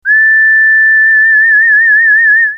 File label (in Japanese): fue